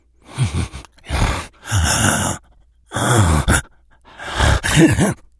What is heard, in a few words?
idle breath, infected breathing; npc; szf